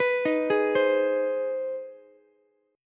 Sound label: C Minor Major 7th Sharp 5th, Ukulele chord; arpeggiated; JGuitar